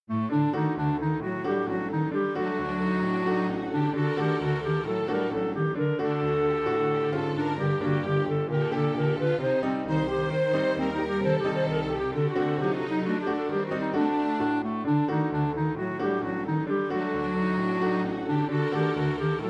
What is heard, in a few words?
Freylekh Klezmer